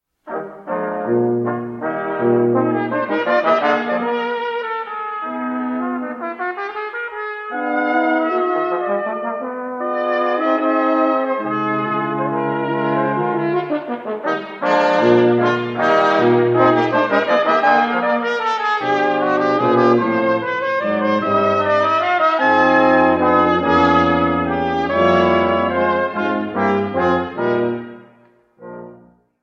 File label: Brass Quintet